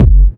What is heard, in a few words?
bassy kick drum f sharp key; kick drum samples